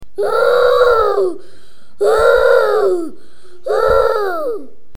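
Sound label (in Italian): elefante, è il verso che fa l'elefante. è un suono forte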